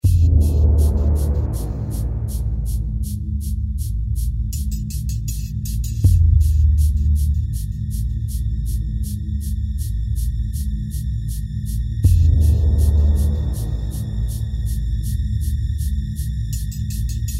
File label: TENSION